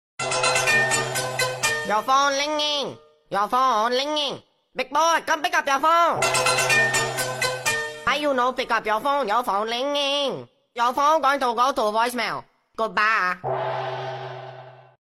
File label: chinese ringtone